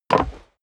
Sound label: step dress boots wood creak jump; schritte; menschen